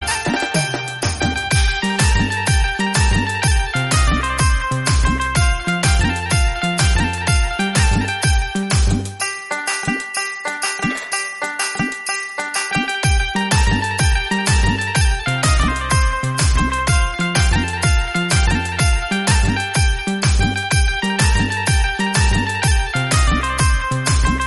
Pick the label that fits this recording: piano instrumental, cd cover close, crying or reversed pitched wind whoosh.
piano instrumental